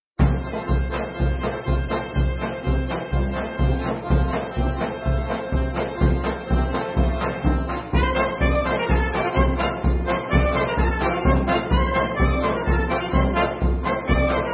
Signa Vlacha Bulgarian

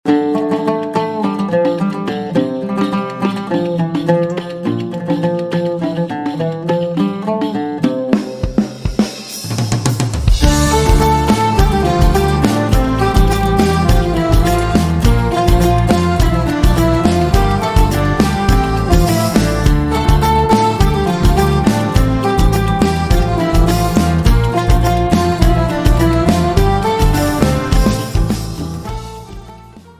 instrumental; andre schor arthur lerer

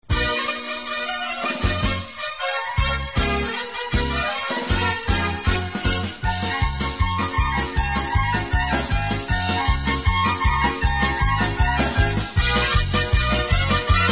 Instrumental

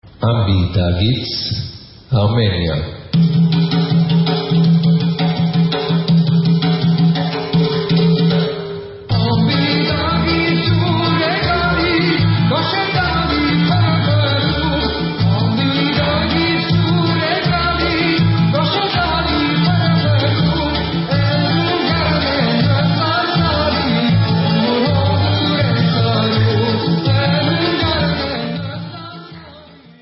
Armenian; Ambee Dageets

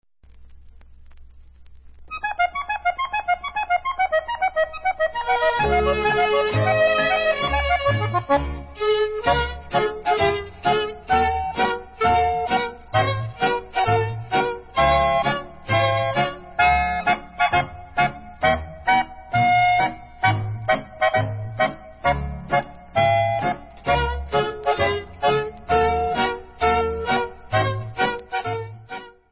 Instrumental

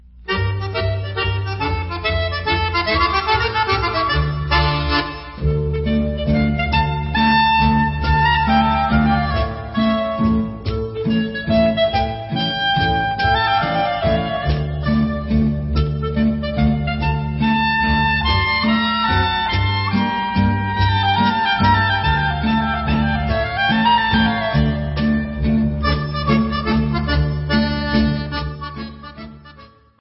Israeli Mazurka, Instrumental

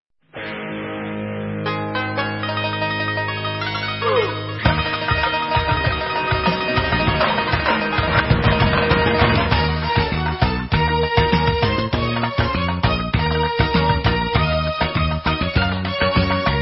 Instrumental

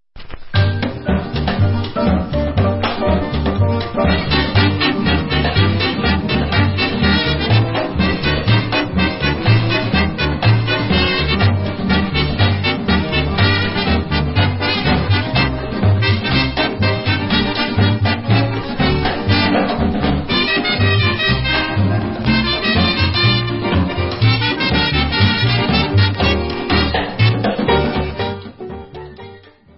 Samba

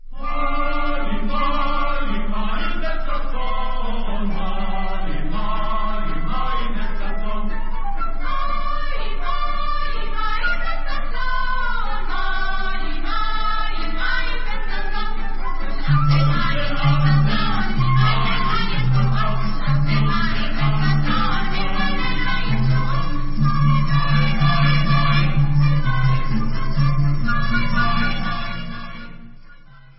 singers

